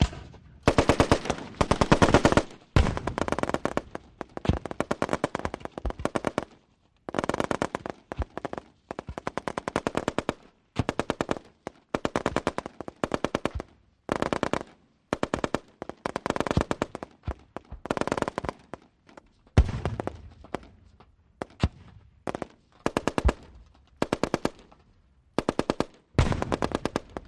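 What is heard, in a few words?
Multiple Weapons Fire, modern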